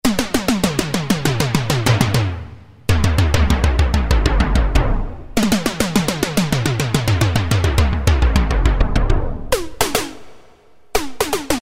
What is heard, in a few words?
Synth Toms, Roland Alpha Juno-2; Factory B